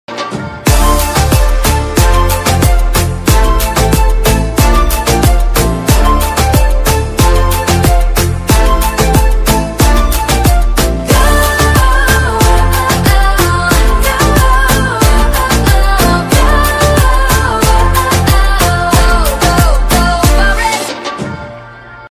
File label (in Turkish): New Trap Remix Zil Sesi; Telefon Zil Sesleri